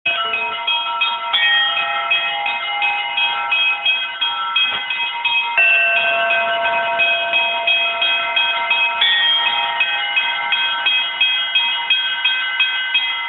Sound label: Bells